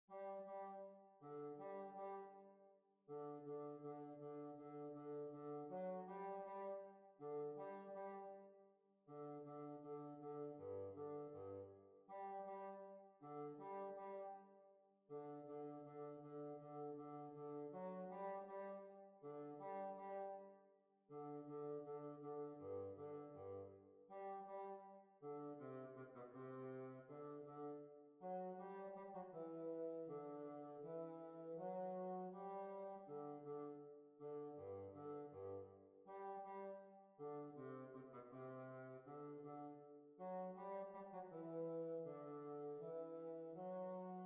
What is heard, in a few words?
Holywell Hornpipe